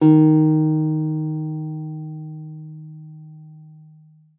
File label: D#3, GUITAR; Xsample VOL-2